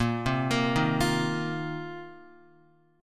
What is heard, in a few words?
Bb Suspended 2nd Suspended 4th Bb down Guitar; arpeggiated